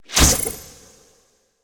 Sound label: cocoon initiate; arachne; smite; arachne skin9